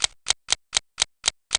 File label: reloading; knight